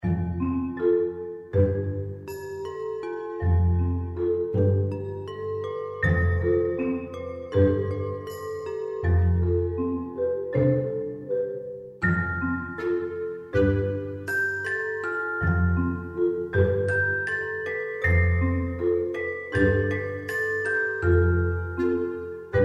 ad interior scene, music

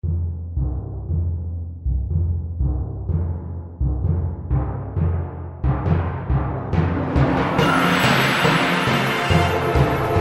fanfare; poptropolis; Poptropica

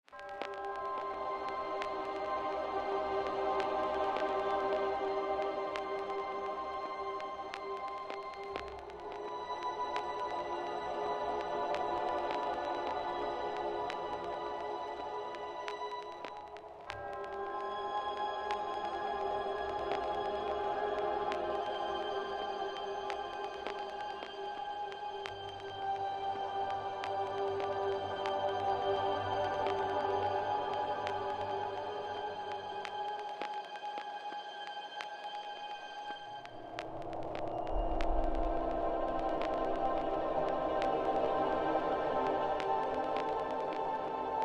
mysterious night, music

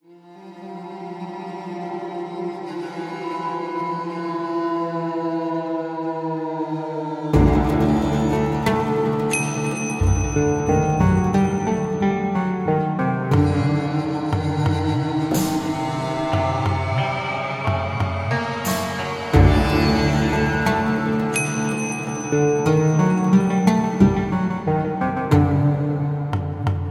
arabian palace, music

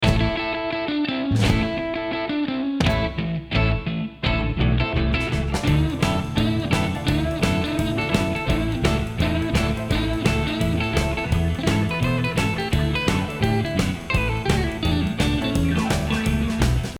prison radio, music

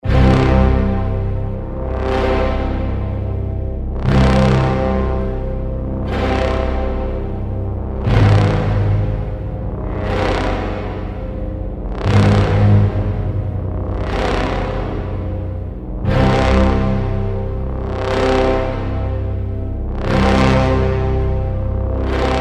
wormhole, music

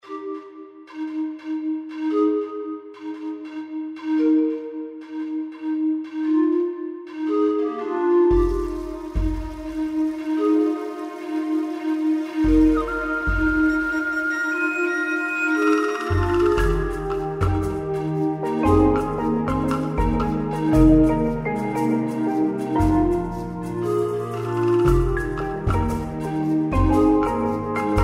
jungle beach; Poptropica

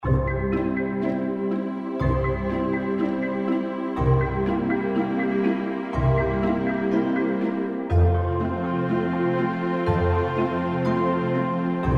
tropical beach, music

